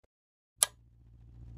klik lamp

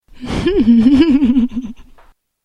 lachen

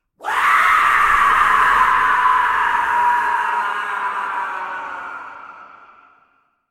harde geschreeuw

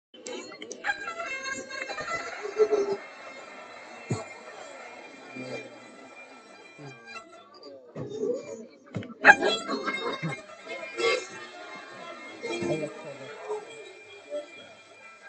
harde schreeuw

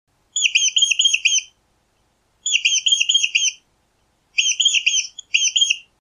korte muis piep

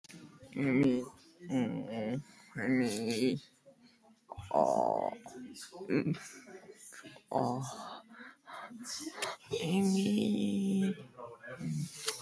kreun

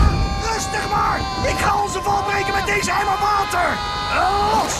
emmer water

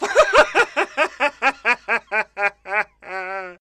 hoog lachje

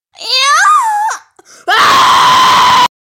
kreuntje

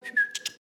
fluitje

